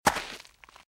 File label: Footsteps - Gravel - Walk - Left; RadwinIsland